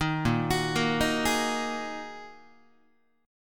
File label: Bb 7th Suspended 4th Sharp 5th Bb Guitar 4A,4E,5A,5D,5G sharp,6C sharp; Bb7sus4#5; arpeggiated; 6,6,9,8,7,7; JGuitar